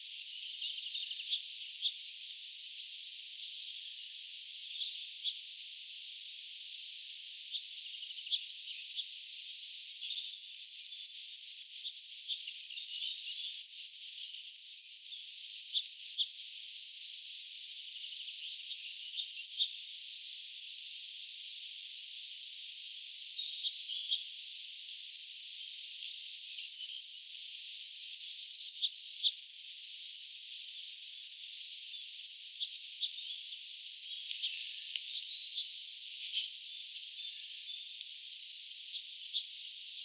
erikoisesti laulava pajusirkkulintu seka peippolintu

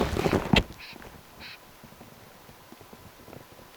ensimmaista kertaa joltain suippopyrstokurppalajilta mahdollisesti hyvin kova aani

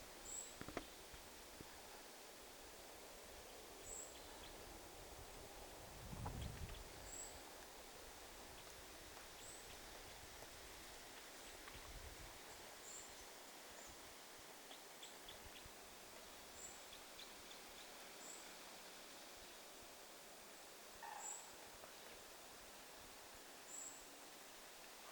nuori punarinta

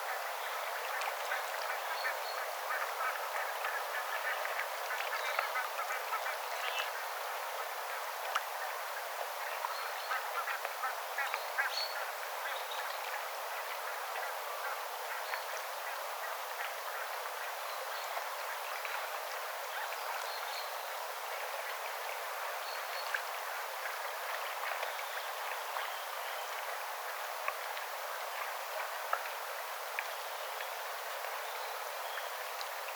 hiukan valkoposkihanhien aantelya

